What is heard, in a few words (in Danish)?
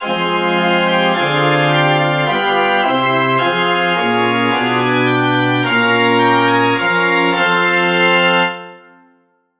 forspil